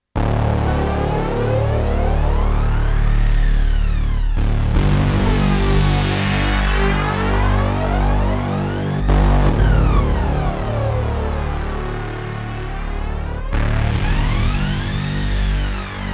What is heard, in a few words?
Equinox Synth